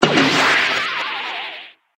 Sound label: Ninjastar parried